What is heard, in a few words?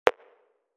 Click